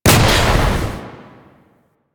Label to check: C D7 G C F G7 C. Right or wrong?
Wrong